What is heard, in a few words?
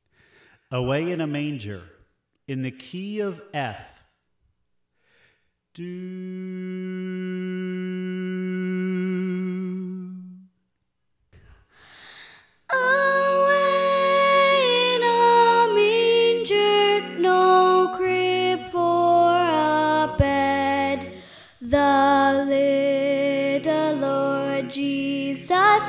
Soprano; Away In A Manger